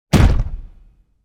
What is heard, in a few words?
cant go; CosmicRage